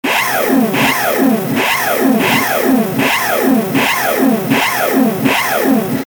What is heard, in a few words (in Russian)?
gitara